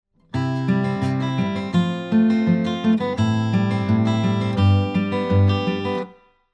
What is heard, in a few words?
guitar 3 finger